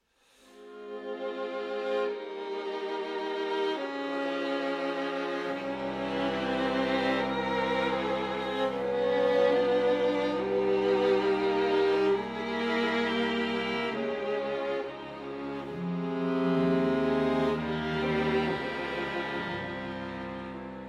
String Quartet in A Major Molto adagio con espressione